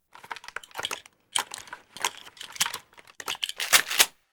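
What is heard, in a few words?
Hunting shotgun reload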